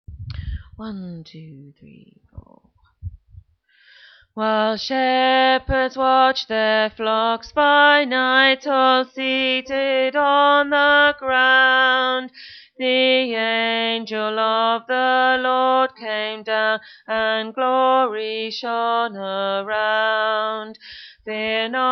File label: Tenor